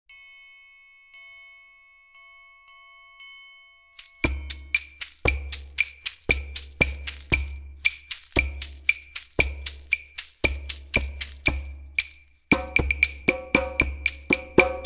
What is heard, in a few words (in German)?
Luegenmaerchen perkussiv